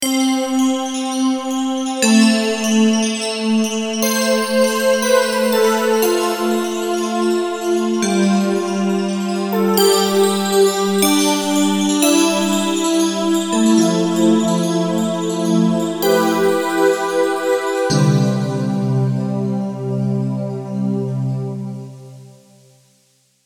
JD-800 Model Expansion

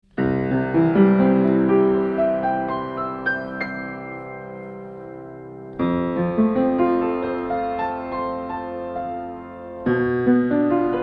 DPA 4560 Piano Player Position

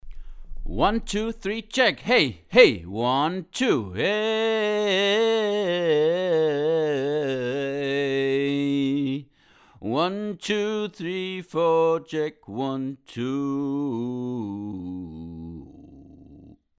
WA47jr Male Voc Close

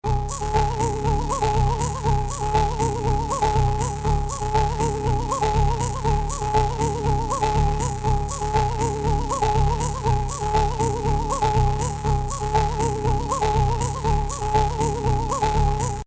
Vocoder Titans

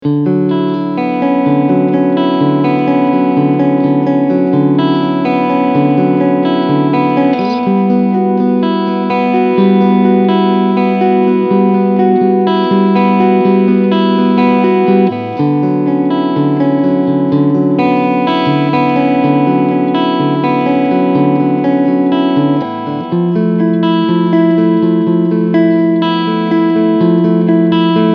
Cleansound Front HB SC

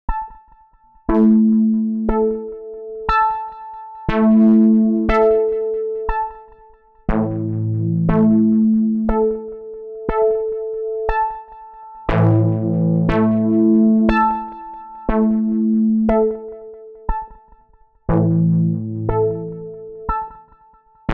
Delay Synth Fat Phaser Tape Original; BIAS Pedal

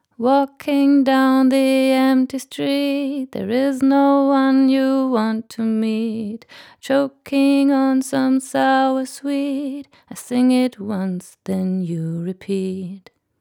Rode NT1 Vocal Maihak V41 EQ

